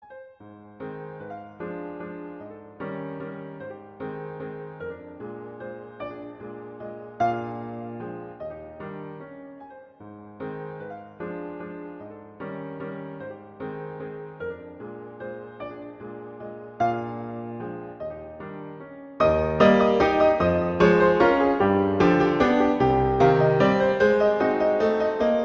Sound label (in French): Valse Allemande; Schumann; Carnaval